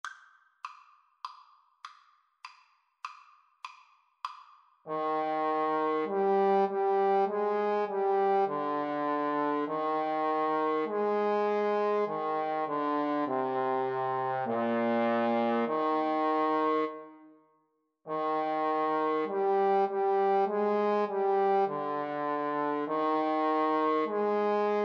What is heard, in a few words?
Trumpet Trombone Duet